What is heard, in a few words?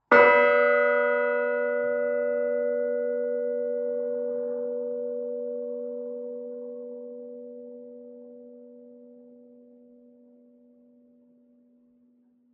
bells, fairwarp